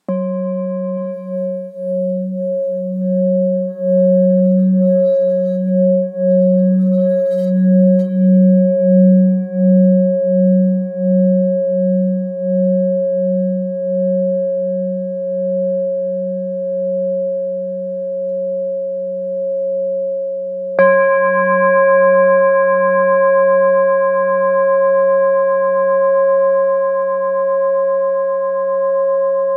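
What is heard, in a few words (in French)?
Bengale do, bols chantants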